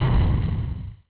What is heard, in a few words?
explosion; robobombo